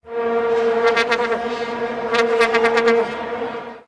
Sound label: blowing the vuvuzela